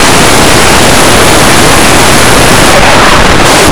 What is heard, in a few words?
big dog; Blue Heat